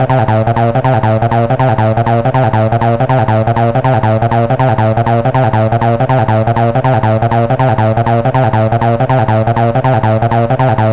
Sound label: Hardcore Loops Acid Loops, Dance Synths, 160